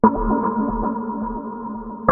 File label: pong loop